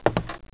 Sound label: footstep wood; footsteps; gearbox